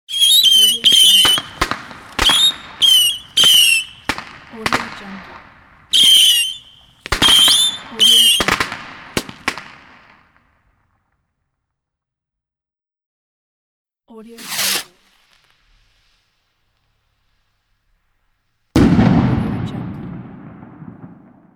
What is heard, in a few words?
fireworks pack